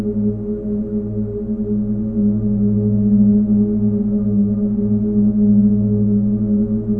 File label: Tonal hum; Doom 3